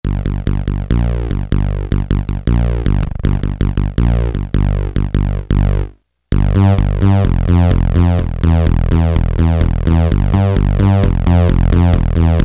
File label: Yamaha PSR-300 SYNTH BASS; Voices